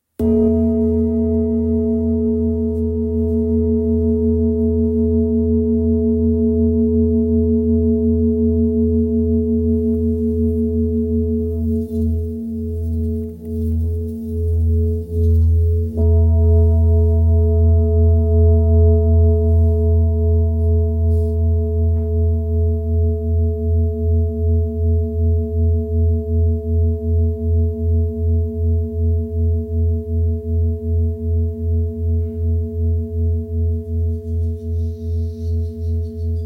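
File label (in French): Bol ramgandi fa diese, bol chantant; Bol chantant Ramgandi à Paroi Fine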